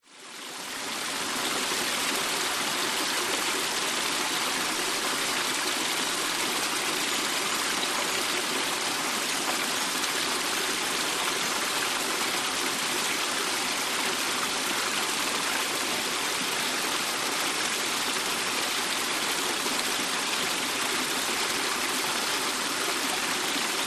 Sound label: nature waterfall small light flow creek rainforest; zapsplat